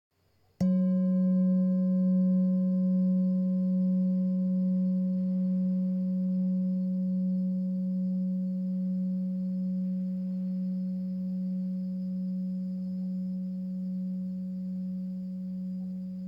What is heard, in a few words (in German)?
Empfehlung, herzchakra klangschale; 471g; 14cm; erleuchteter buddha